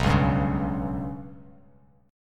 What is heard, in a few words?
B Suspended 2nd Sharp 5th; Bsus2#5 strummed; JGuitar